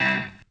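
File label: synth stab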